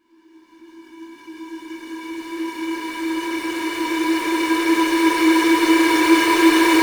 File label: 140BPM Hard Square E, Melody Fills; 4Bars; Vengeance Samples